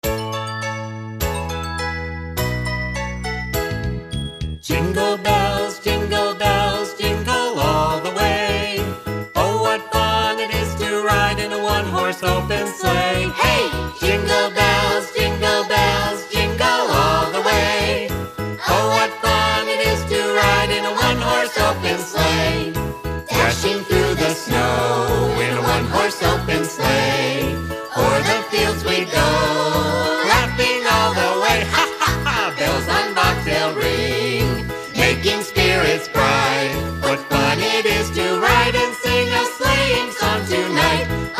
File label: Jingle